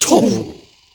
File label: FIRE BULL